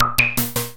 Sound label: LOOP, DRUMS + PERC; Rubber Chicken Software Sample Bank